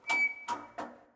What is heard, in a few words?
Wheel Rotat Creak